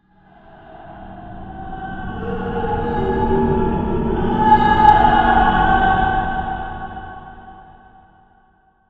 Ambiance

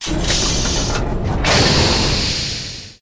Door Locks; timez attack

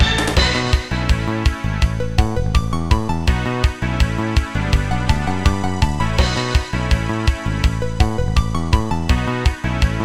Level Up Loop; backpack hero gamerip 2022

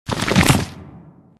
Dwarves Geomancer Transform

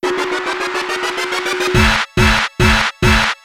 Sound Bomber - Clear; Mario Artist; Polygon Studio; N64

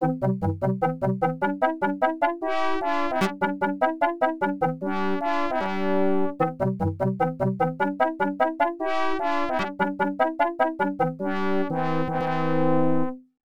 Fanfare (Clear); Cham's Fanfare; kick off jaleco mega system 1 arcade

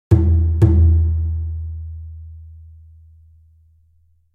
Perc

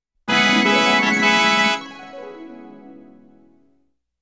Fanfare Jingle; genso suikoden original game soundtrack

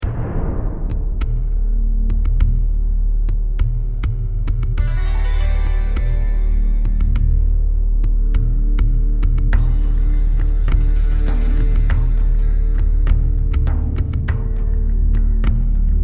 ambi quiet

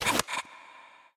Bow Hit

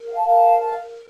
Noble Roar

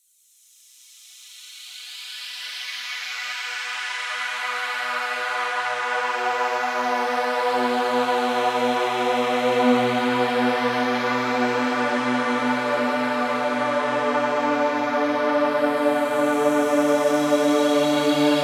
Ambient Loop; Ambient 8 Loop; Drox Operative

